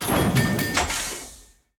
Blacksmith Upgrade

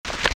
UI Note Open

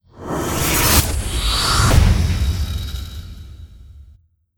Ghost Spectral Wave

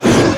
Monster Effort Big

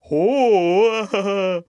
Cheer

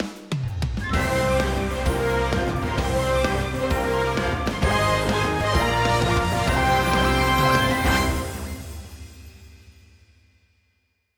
Ranking Display; Capcom Fighting Collection 2; PS4, Switch, Windows, Xbox One

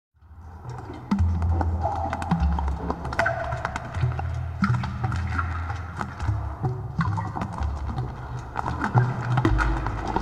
Magic Cave Drips Very

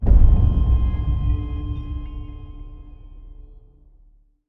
Frenzied Stinger

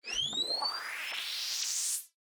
eagle boss Charge; enemies; unsighted

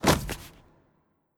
Slave Fall; Legend of Keepers (gamerip) (2019)

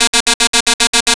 Overfill; Audiosurf 2

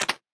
Button Esc

